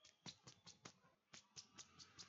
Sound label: bat; RPG sound effect